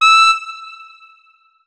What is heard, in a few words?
notes, saxophone; Hideout Furniture